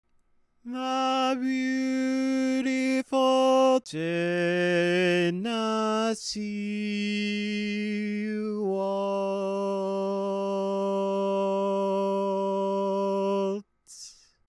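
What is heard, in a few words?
Baritone, Barbershop Tags; Maloney's Version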